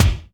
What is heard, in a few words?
Dry Kick